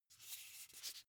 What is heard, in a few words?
car flip key handling